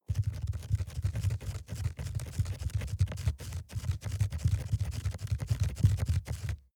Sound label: rat run trample fast